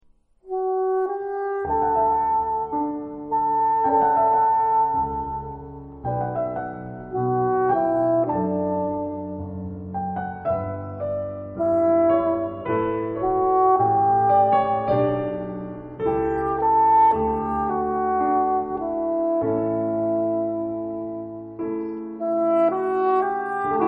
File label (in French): A Chloris basson